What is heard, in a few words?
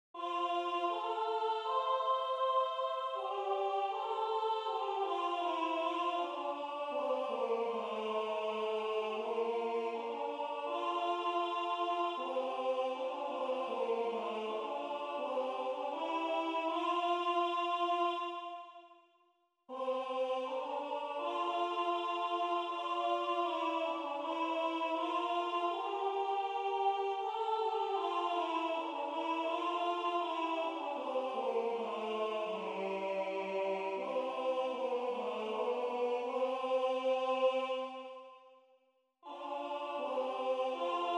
Revecy Teil 1 Contralto; Revecy venir du printans Teil 1